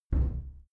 cupboard close soft

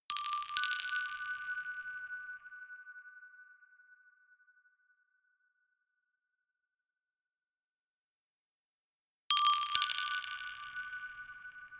notification glockenspiel rattle third up

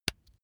razor attachment mount

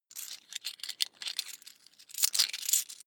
coins big clatter

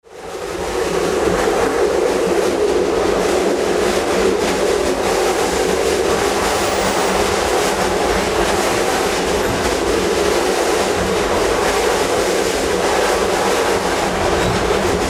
old train cabin inside wheels noisy

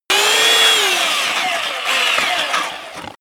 sander stop

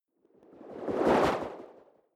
whoosh simple flutter futuristic

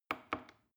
plastic button small click regular slow

